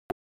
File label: Hamhit